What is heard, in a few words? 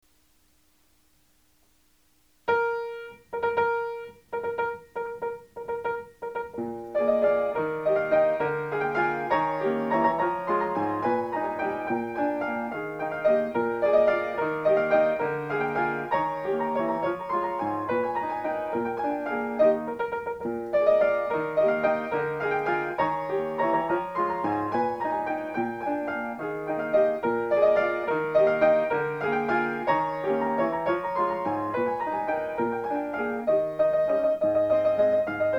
grand waltz